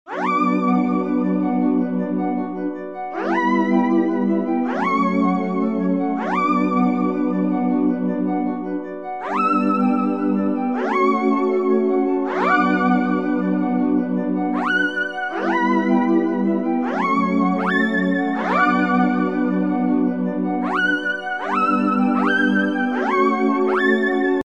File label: Pad-ish; Crowdsourced Sample Pack; TRUTH-HZ; Instrumental Loops